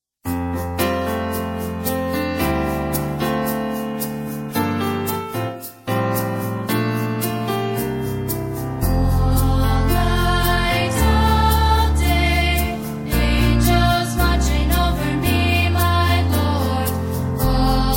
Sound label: Vocal; All Night, All Day